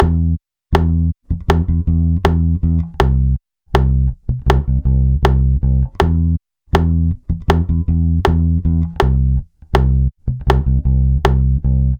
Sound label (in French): Rythme Note Morte 80bpm